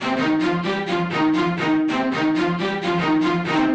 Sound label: Stacc Str 128 C, uk garage, Synths; 128bpm Lines n Loops